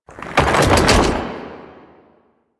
Archgun Charged React; Warframe; Archwing

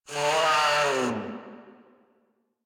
Kavat Vocal Death; Warframe; Khora

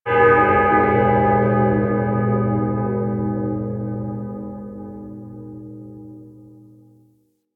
Distant Town Bell; Duviri

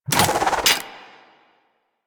Spear Reload, Weapons; Warframe; Tenno; Priest Spear

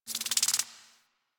Insect, Alchemy Lab; Warframe; Hub; Liset Alchemy Lab

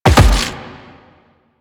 Land Rock, Footsteps; Warframe; The New War; Kahl; Quests

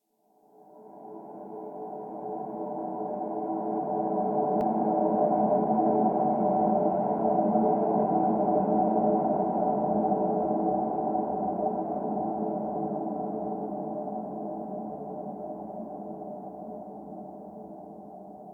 Exterior Texture, Ambience; Warframe; Rail Jack

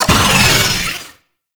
Boss Vocal Pain; Warframe; Oraxia

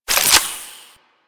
Bard Rifle Reload; Weapons; Warframe; Tenno

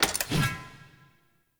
Ur Piece Protected; UI; Minigames

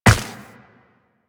Run Ice, Footsteps; Warframe; Tenno

